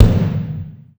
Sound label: block, Misc Percussion Ticks